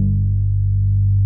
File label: MINI, MOOG BASS